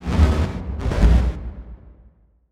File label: Transition Blast Pulse; Adobe; Production Element